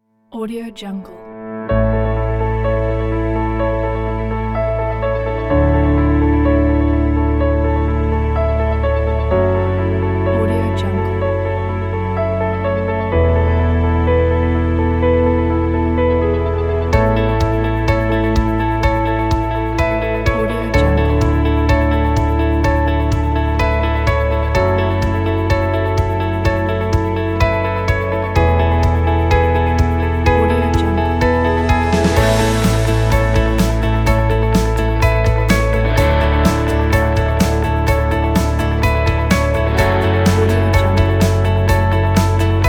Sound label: inspiring pop